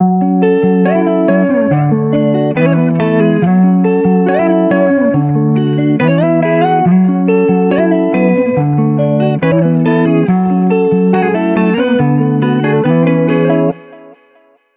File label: Melody Clean